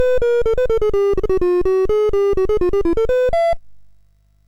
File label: MOS6581R2, GAMES; Sammy Lightfoot